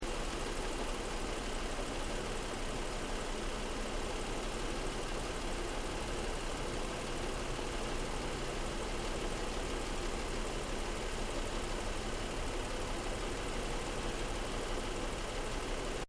CAR NISSAN 350Z ENGINE BAY IDLE LOOP, manual sportscar; Cars; Vehicles; SoundEffects-Foundation1